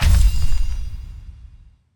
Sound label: decision, button